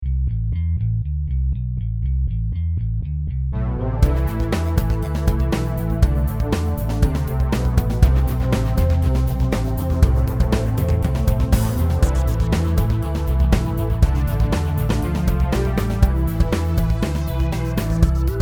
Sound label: Stormy Ensemble